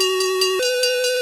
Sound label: dispatch; comms; CosmicRage; CosmicRageSounds